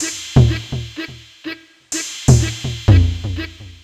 perc empty 125, Percussion Loops, Classic Chicago House